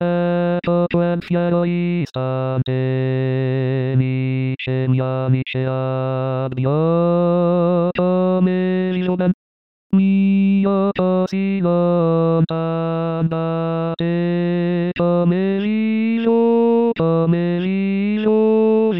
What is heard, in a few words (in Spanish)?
hombre; Mozart